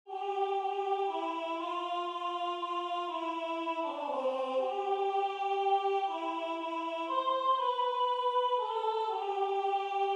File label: Soprano